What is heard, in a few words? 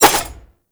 Spin Whoosh, Dual Daggers; Prime Okina